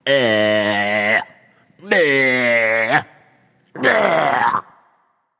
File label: tongue; Smite; baronsamedi